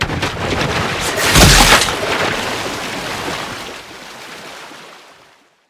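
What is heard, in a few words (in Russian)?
нервное плескание тонущего человека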